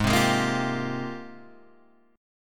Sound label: Gsharp Major 13th, G#M13 chord; strummed; 4,3,6,3,6,3; Standard